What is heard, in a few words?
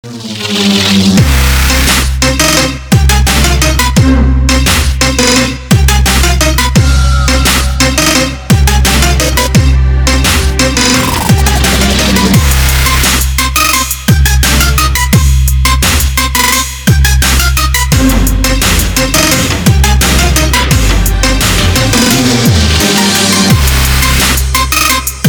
arab trap